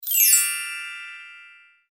Tag dudoso arpegio; Do Not Feed the Monkeys; Switch, Android, Xbox Series X, PC, Linux, MacOS

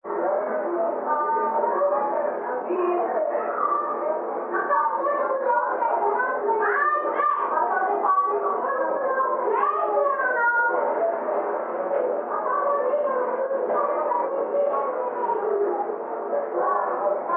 fondo multitud niños

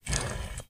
COLOCAR LADRILLO; Yesterday Origins; Switch, PS4, PC, iOS, macOS

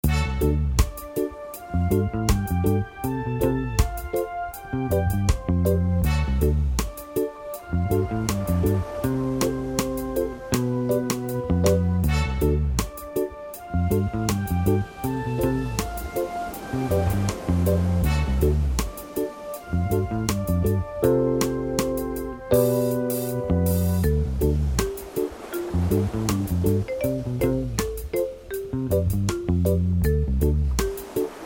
Música Menú (Loop); Surface Rush

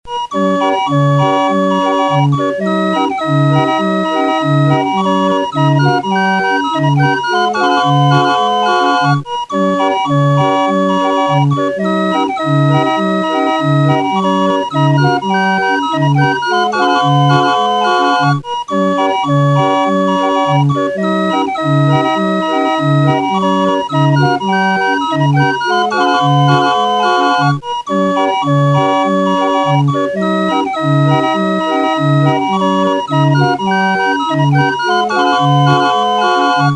Organillo; Abrapalabra La Magia de Aprender a Leer

